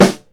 Short Snare Drum G Key, snare single hit tuned to the G note. Loudest frequency: 1042; snare drum samples